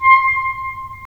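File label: wind clarinet; Roland - LA Composer vol. 3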